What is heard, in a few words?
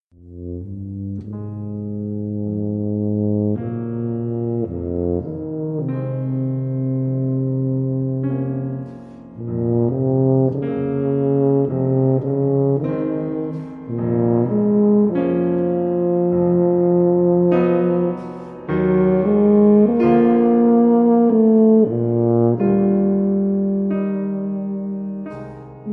Tuba Sonata